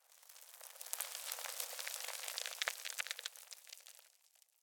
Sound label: rockfall; dust2